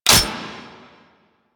Sniper Mech; DE; Warframe; Warframes; Frumentarius; ExaltedSniper

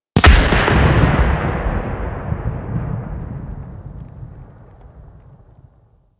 Tower Mining Burst D; Warframe; Ambience; Eidolon Plains; Ostron Village

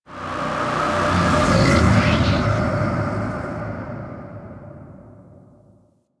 Disturbance, Ambience

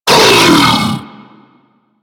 Infested Diseased Ancient Pain; Warframe

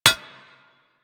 Bullet Impact Armour; Warframe; Weapons

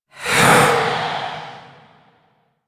Rell Specter Vocal Attack; Enemies; Warframe; Priest Quest

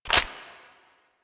Heavy Weapon C Clip In; Warframe; Heavy Weapons

